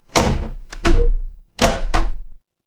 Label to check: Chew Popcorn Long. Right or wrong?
Wrong